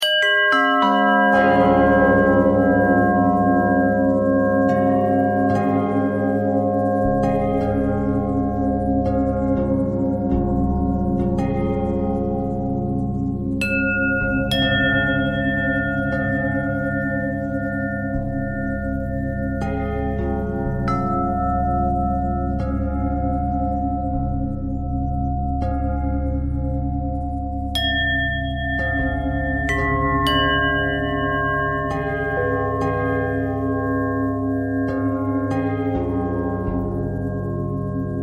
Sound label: bell bell thread pentatonic, bells